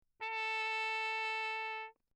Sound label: TRP MUTE Lechner; Engemann; Perinétventiltrompete; Mutes